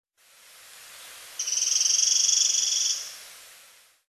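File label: Eurasian Wren; misosazai; hokkaidou; koe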